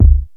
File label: Drum